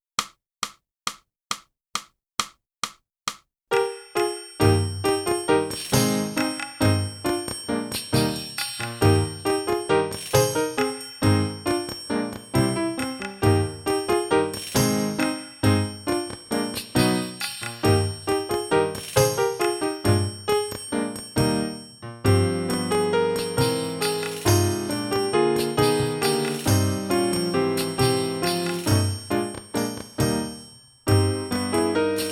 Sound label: clarinet